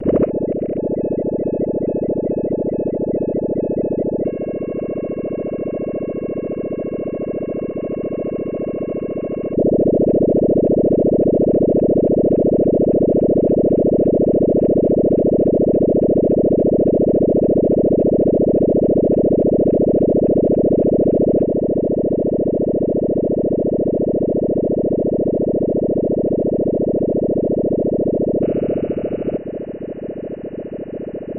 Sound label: Blubbering Alien Sounds